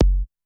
kick, Drum Machines, Clavia Nord-Drum; 300 Drum Machines

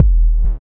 BUZZER; Spectrasonics; Metamorphosis

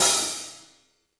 hihat - opened; JazzFunkKit

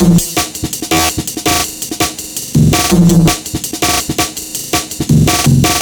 165 Stretchy Break, Loops; m8 breaks

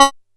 C#5, Bass; E-MU Producer Series Vol. 6 – Analogia Project; Boom da Bass

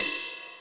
cymbal, Roland MC-909

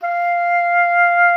F5, OB D.AMORE; Xsample VOL-7

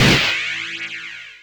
D+B

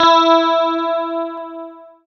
GUI, E-Guitar; Sound & Vision; Gigapack I CD 2; GUI E-GUITARS; GUI E-Guitar 1

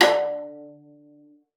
D3, VIOLA FINGER; Xsample VOL-5

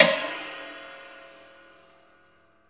GUIRO

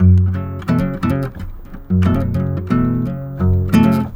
F# MINOR, Nylon Guitar

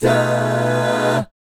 GUY CHORDS, Vocal Planet Jazz; ILIO; Vocal Planet VOL-3; Jazz & FX

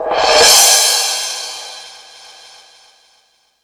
Crash, Drum Machines E-MU Pro-Cussion

